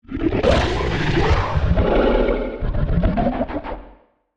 Feline Short Loud; Monster Sounds; TheDeclineOfWarriors; Monster Sounds Volume II

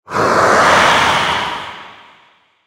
Zombie Agressive, Monster Sounds; The Decline Of Warriors; Monster Sounds Volume II

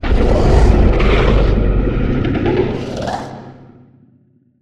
Low Roar Strong, Monster Sounds; The Decline Of Warriors; Monster Sounds Volume II

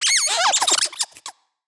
Tiny Creature Stuffed Animal, Monster Sounds; TheDeclineOfWarriors; Monster Sounds Volume II

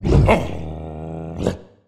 Canine Long Roar; The Decline Of Warriors; Monster Sounds Volume II

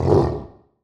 Canine Fast Roar, Monster Sounds; The Decline Of Warriors; Monster Sounds Volume II

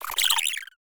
Tiny Creature High Pitch, Monster Sounds; The Decline Of Warriors; Monster Sounds Volume II

